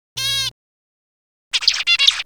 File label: female call and male song sample